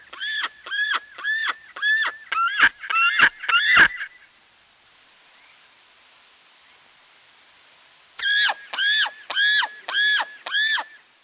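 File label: sekretar, secretary bird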